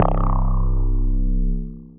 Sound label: Overdrive Space Boom, bass; Ableton; Factory Packs; Punch and Tilt